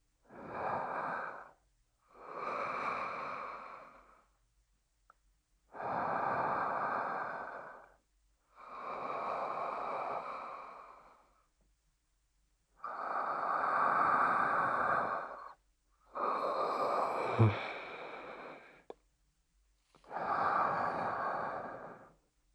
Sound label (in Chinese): 寝息声音轨